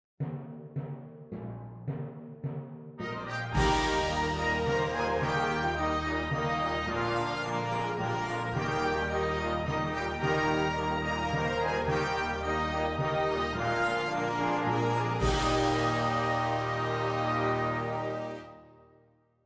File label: Austrian Folk Song